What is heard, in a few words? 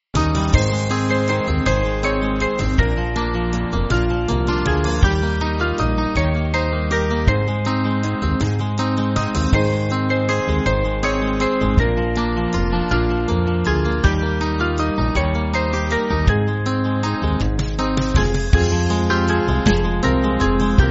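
Music Backing Files for Churches; Hasten Dear Friend; Welsh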